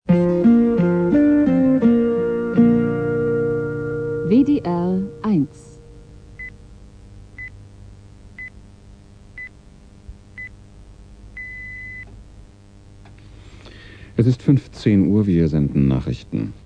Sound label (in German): nachrichten, jingles